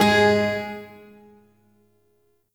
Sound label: Shawzin Oct One G Sharp; Warframe; Duviri Shawzin